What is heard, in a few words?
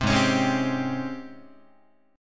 G sharp Major 11th G sharp, Guitar; JGuitar